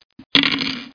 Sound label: roll; yahoozie